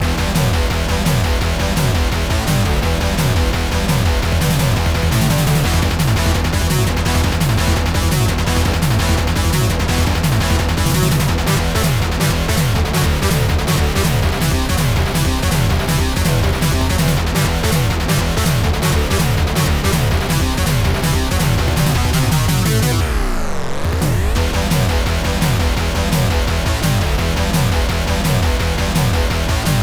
Stage 2 Boss; thunder force iv; Attack Sharply

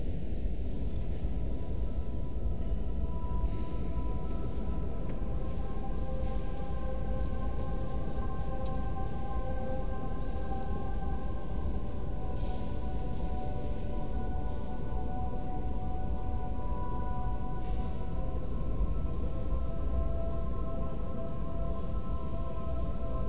with Choir

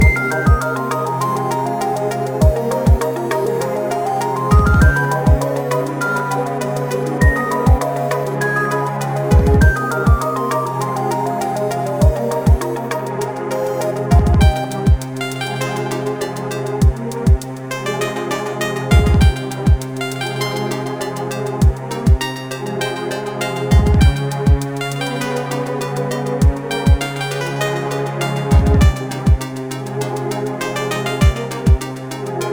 Stage back Loop; invercity

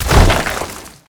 Rock Fall

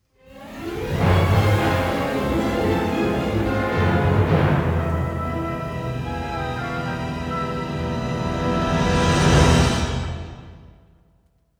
Battles Imperial Lose; Hoth

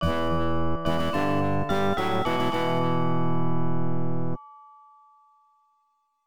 Game Over Music; The Return of Ishtar; Fujitsu FM77AV

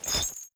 Chain move; helltaker

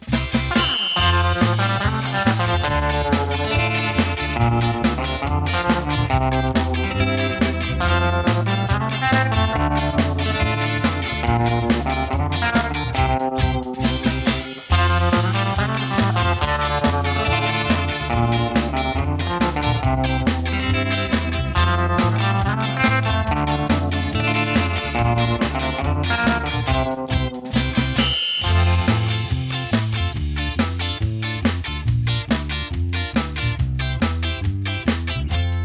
Happy Ska

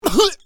Pig hit; bad piggies; android; mobile; windows

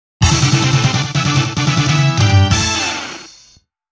Player Win

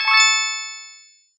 Move Bgm End; Gachi Yagura

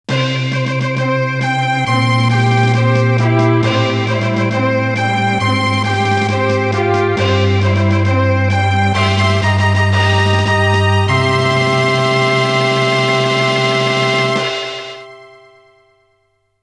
Fanfare - G1 Race; koei original bgm collection vol.13; winning post; winning post 2; Kansai

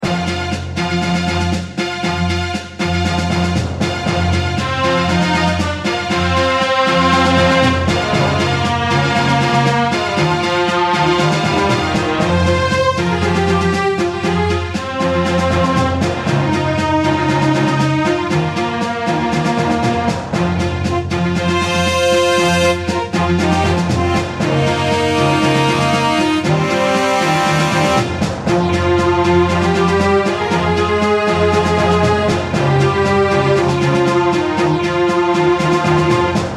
Bgm Fantasy; rpg maker mv

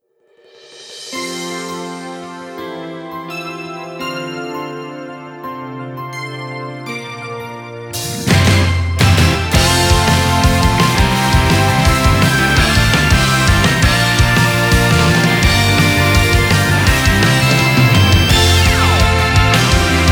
off vocal; Clochette; OP Song Collection

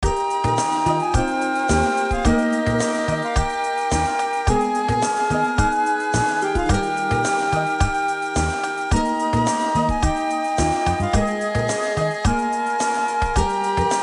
Background Music

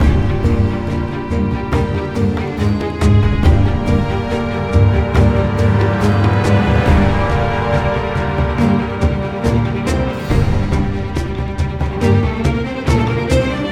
String; Assassin's Creed Unity Dead Kings

